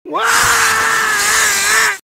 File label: scream; tow maters